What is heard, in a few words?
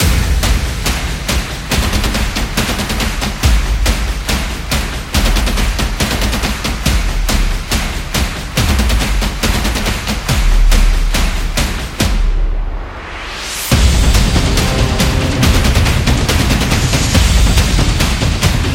Marching Band